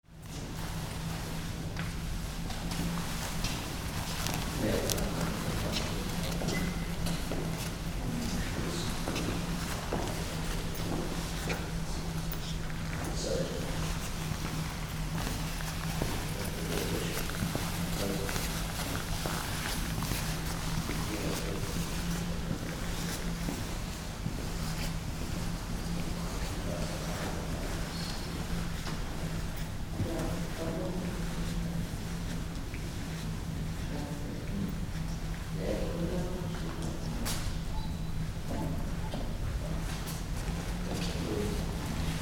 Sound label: in the museum